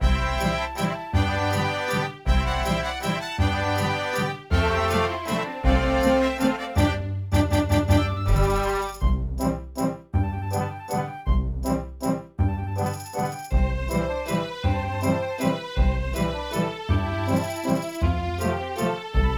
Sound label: Classical Stage Karaoke; pinky street kira kira music hour pop princess gamerip